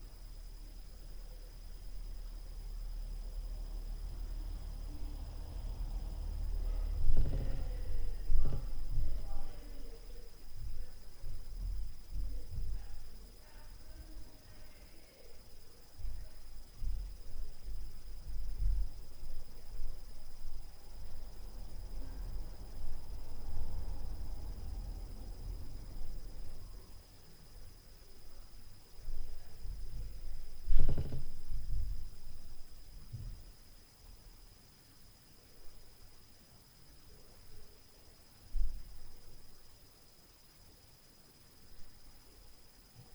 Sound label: Cars + Upstair LF Noise, Street Noise